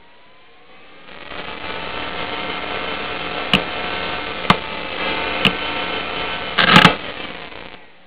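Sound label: Bad Head, hard drive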